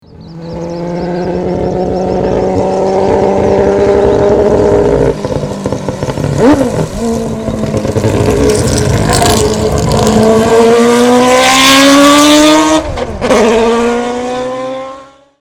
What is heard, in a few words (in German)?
Mazda 787B langsame Vorbeifahrt und Beschleunigung